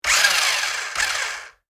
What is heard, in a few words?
RC toy car remote controlled short rev; rc toys sound effects